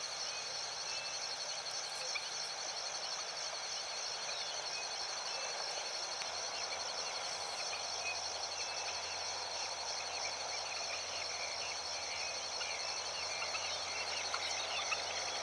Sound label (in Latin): Tapera naevia; Chajari; Siburu y Libertad